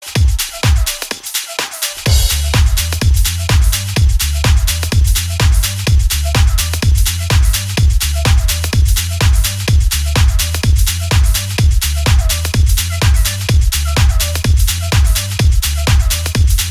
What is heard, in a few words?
deep dance music tone